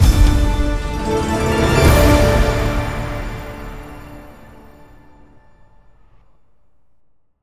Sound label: Large Win long; netent